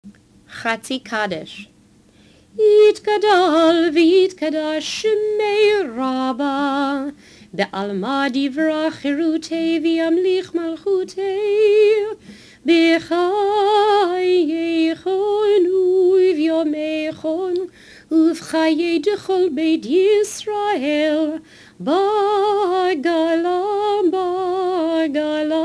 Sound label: Cantor Chatzi Kaddish